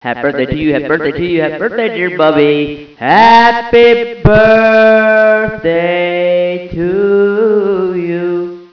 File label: sing